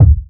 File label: low tone kick drum sample d key